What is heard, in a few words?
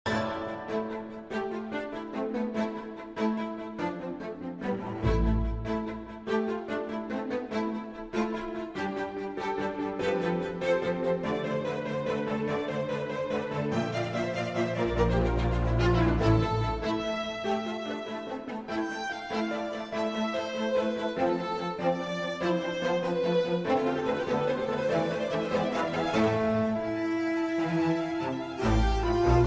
Ambience, Boss Theme; Hollow Knight; Hornet